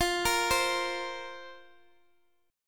F Suspended 4th, down, Guitar, Open D

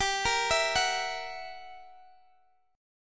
F sharp 7th G; arpeggiated; F#7/G

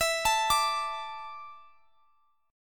E 7th Suspended 4th E down Guitar Standard; arpeggiated; chord; JGuitar

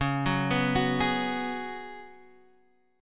Db 7th Suspended 4th Db down Guitar Open F; arpeggiated

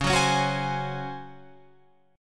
Db Major 7th Suspended 4th Db down Guitar Open F; strummed

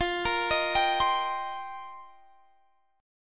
F 7th Suspended 2nd Suspended 4th, Guitar Drop D

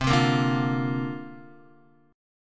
C 7th Suspended 2nd Suspended 4th C down Guitar; C7sus2sus4 strummed; C7sus2sus4 chord

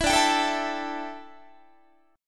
D Suspended 2nd Suspended 4th D down Guitar

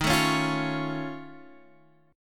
F Augmented 7th D sharp, chord

